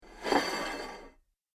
Large Dinner Plate Plate Slides Over Table; Dishware Sound Effects; Household Sound Effect

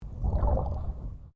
Underwater Movement Swoosh

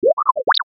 Ascend Digital High Chirp; Blastwave

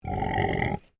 Animal Pig Landrace Growl; Alice; Edgar's Mission Farm Victoria; Pigs; Animals

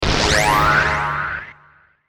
Ascend Delay Zap; Blastwave

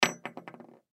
Ring Set Down Wobble; Jewelry; Set Downs (Sound Effects:Objects); Foley; Blastwave

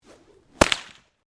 Bull Whip Snap; Whipping; Combat; BlastWave

